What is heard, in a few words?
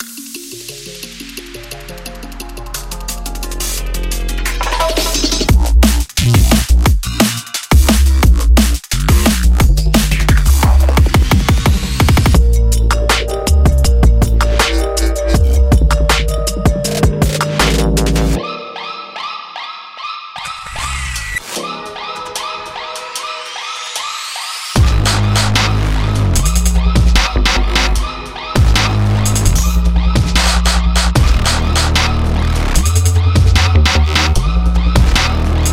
rumble, Rumble Bass Synth; uvi-rumble-4; testbericht-rumble-bass-synth-von-uvi